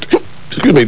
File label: hicup